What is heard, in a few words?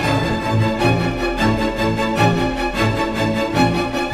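ocean world music glouglou suspens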